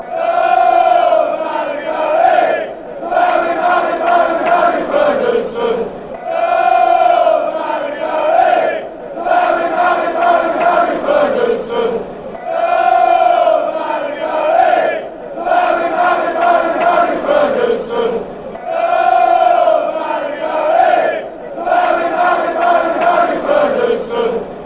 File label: fanchants; oh barry ferguson